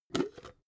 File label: jar glass plastic open cap